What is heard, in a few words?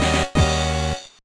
Jingle; e-Reader; GBA